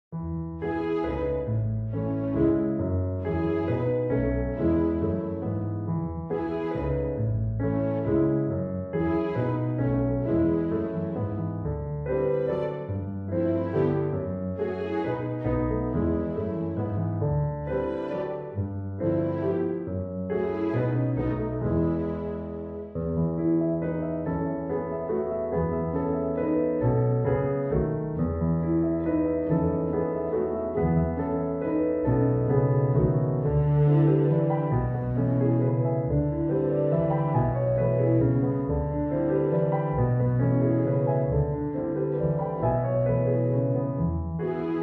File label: With Strings